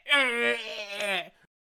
Death Vocals; Bank Robbers

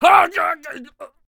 Headbutter Death Vocals

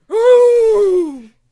Harvester Attack Vocals

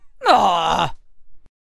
Lady Red Jade Death Vocals

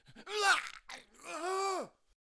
Death Bringer Death Vocals

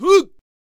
Chieftain Attack Vocals

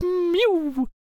Chariot Attack Vocals

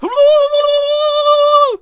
Blunderbuss Attack Vocals

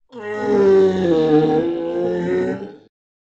Ice Giant Death Vocals

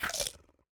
Raptor Bite Sounds

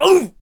Hwacha Attack Vocals

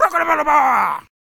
Knight Attack Vocals

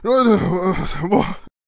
Traveller Attack Vocals

